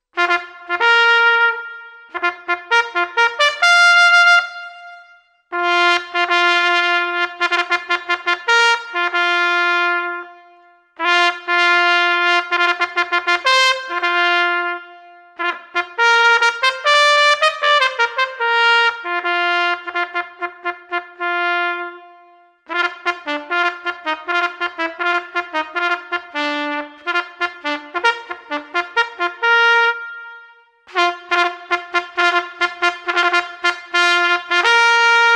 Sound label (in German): trompete